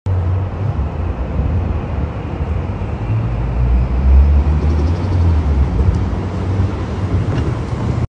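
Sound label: Nissan Skyline, car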